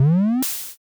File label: compressor